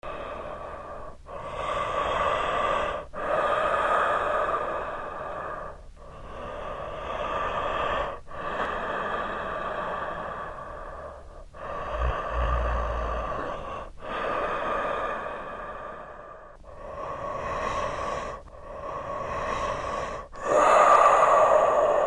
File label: dark breathing slowed